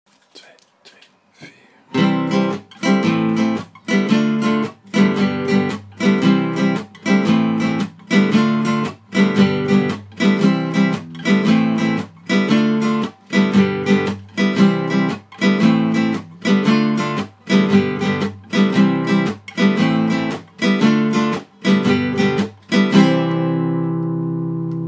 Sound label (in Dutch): akkoorden